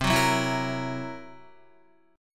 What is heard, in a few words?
C 7th Flat 5th C down Guitar; C7b5 strummed; JGuitar